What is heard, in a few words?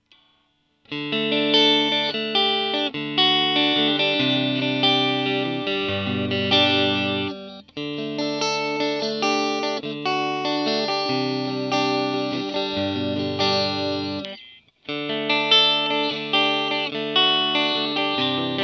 bulldog clean